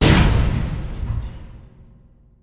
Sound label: metal debris